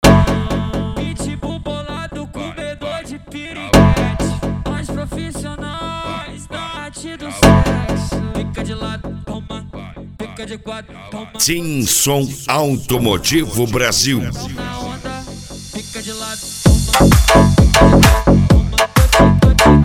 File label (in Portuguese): funk tum dum